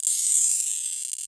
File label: GLASS CUT; Rarefaction; Poke in The Ear With A Sharp Stick